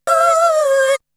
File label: Vocal; ZERO G - Datafile DISC 2